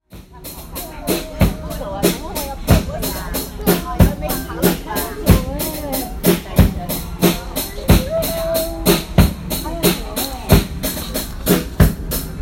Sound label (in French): shanghai est un kiosque à musique